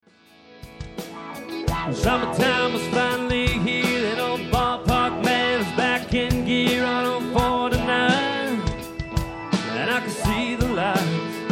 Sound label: Tribute Band